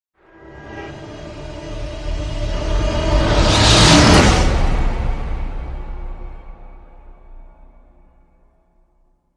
Rise

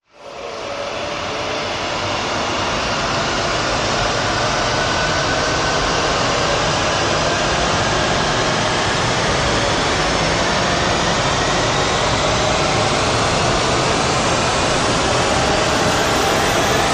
Rise Long Choral Scream

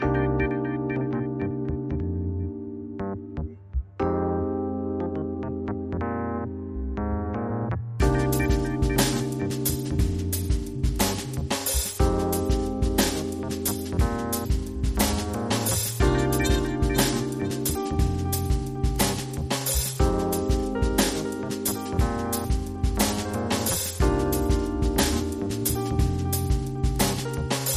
Jazzy Cool

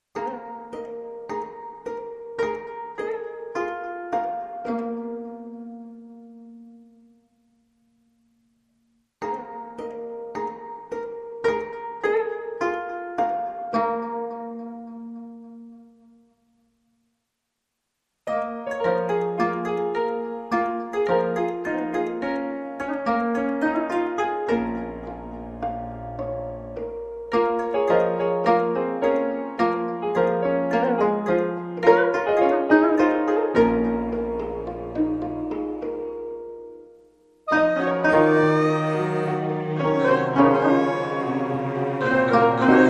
Ambient Version

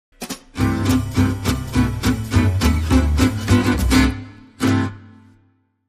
Clarinet Version

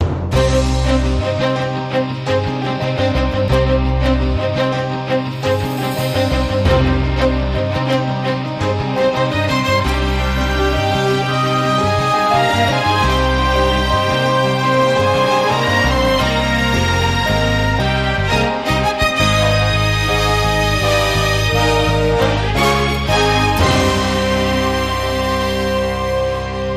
World News Update No Drums

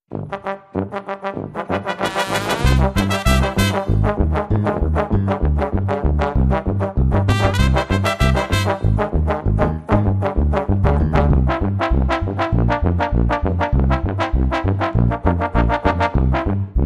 Bavarian Ska, No Drums

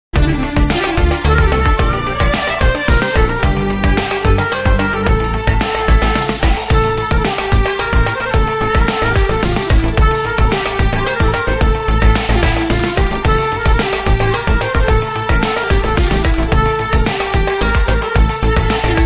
Turkish Love (Modern Remix)

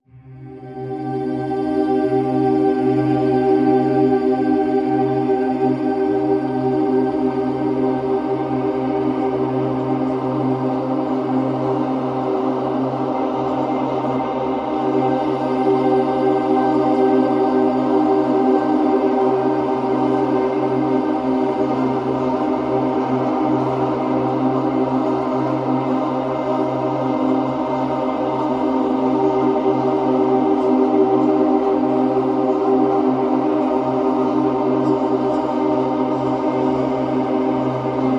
Vocals Glorious Ethereal Pad

